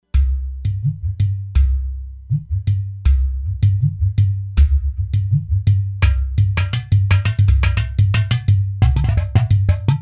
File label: Udu Tones; Factory patches